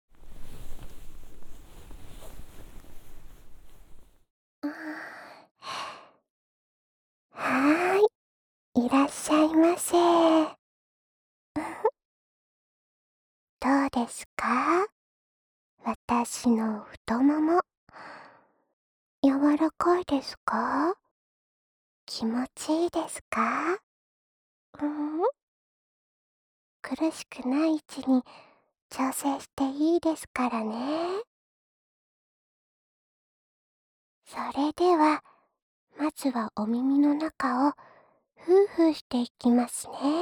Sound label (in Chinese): 清理耳朵; 首先從清理耳朵開始……